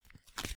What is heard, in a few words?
Card Enter